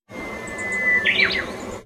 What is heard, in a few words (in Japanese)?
uguisu ikoma